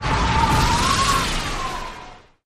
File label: skill effect